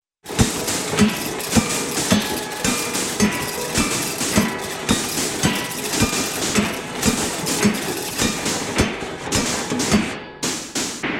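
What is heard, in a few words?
Machine Steady with rhythmic Metallic Clunks, cartoon; Hollywood Edge; Cartoon Trax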